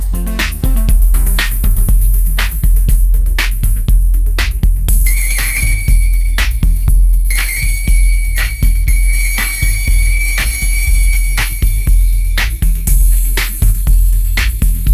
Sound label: pop groove